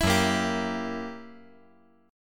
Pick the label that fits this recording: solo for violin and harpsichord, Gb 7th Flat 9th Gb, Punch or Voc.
Gb 7th Flat 9th Gb